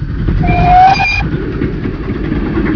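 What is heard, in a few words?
RAIL WHISTLE; TRANSPOR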